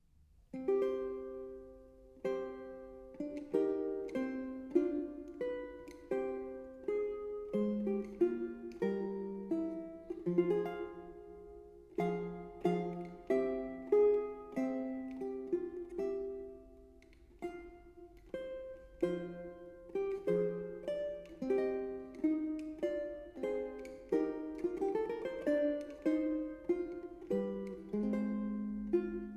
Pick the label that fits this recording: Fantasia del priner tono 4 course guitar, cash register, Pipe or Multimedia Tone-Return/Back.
Fantasia del priner tono 4 course guitar